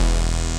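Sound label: synth E, BUZZ SYNER, House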